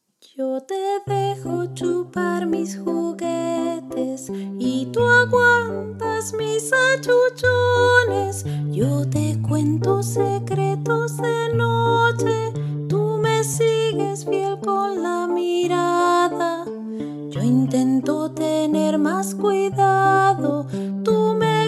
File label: piano